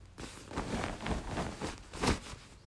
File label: Duvet Constant Ruffles